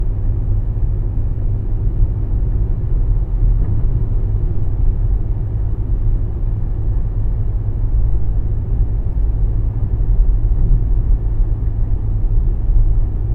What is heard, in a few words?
driving over a freeway